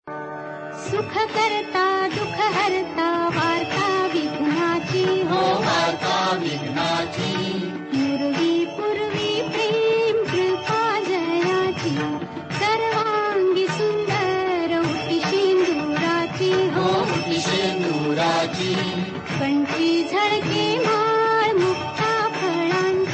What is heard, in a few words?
Aarti Ringtone